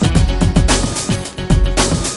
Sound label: loop